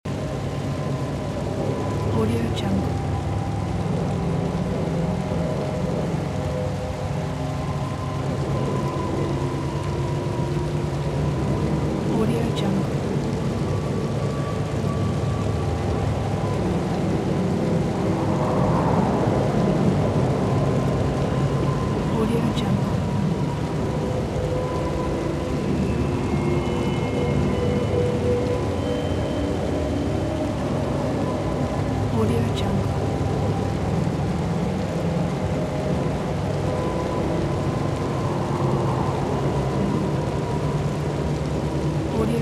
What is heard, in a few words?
dripping underground grave